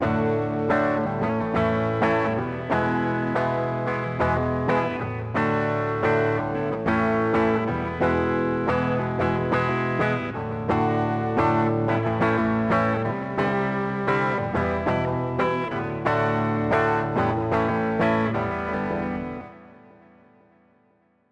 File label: guitar eq before